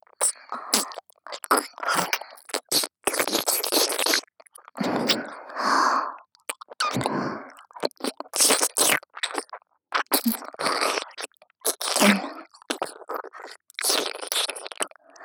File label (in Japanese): 両耳舐め (ループ); SEあり